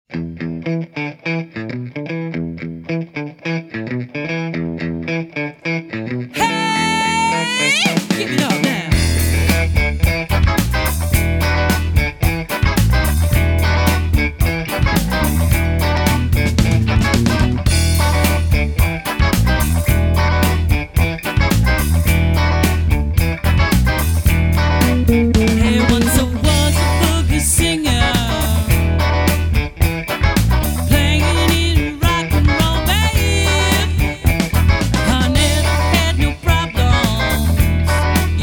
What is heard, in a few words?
Metro Funky Music